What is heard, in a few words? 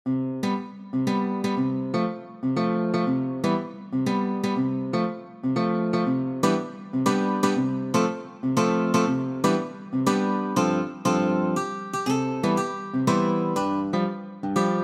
happy birthday jazz